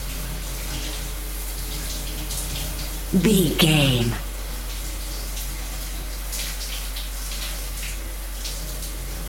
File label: water running shower dripping loop